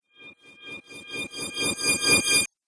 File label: Fantasy Mage Spell, magic